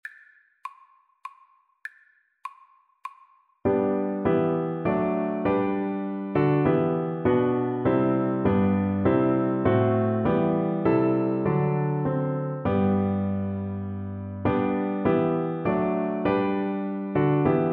Cello

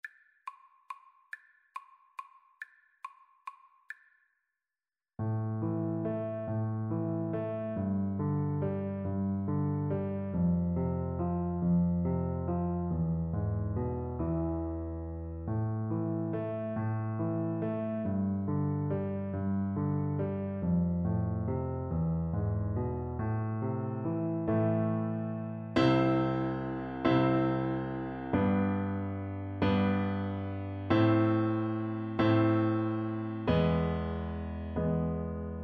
piano duet

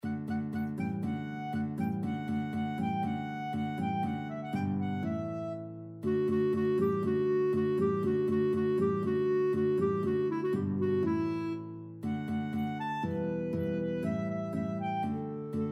guitar clarinet duet; vivaldi autumn

